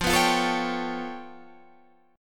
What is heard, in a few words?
G 9th Flat 5th F